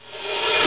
hi hat, percussion instruments; Sound Effects for Multimedia - Volume 1

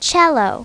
cello; My Talking Dictionary

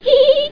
GIGGLE

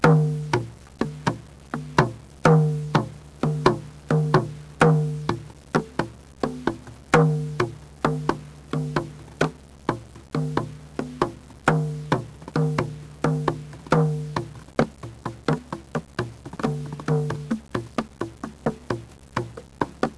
rythm, drums; music

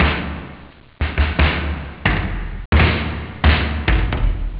jam; OctaMED SoundStudio V1

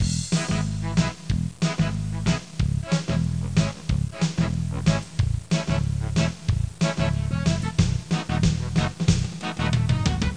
Music; Bible Fun Pack; Education Publishing Concepts; NOAH

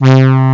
brass; Amiga Special - Software Hits; orchestra

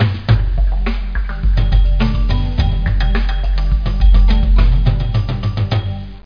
Music; Triazzle 1.0 Demo; 11khz Music

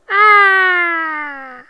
Arrr; GoJoe PoGo

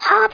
jump; Danish

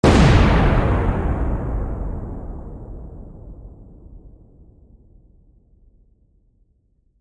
bonus atom; VenusMission; Aventura

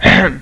Ahem; Communicate Lite Demo; Communicate Lite Sounds

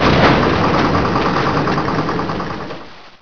KRASH; Pirates! Gold

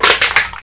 CRUSH, robots

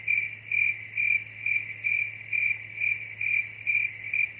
Crickets Night; 1944 Battle Of The Bulge

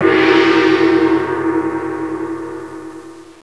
GONG; Renaissance

